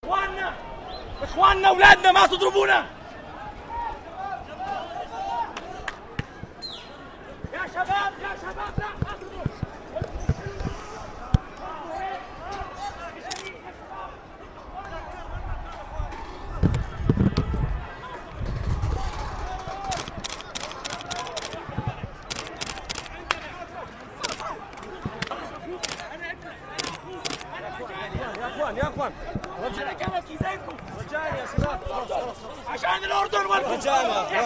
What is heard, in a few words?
Police beating man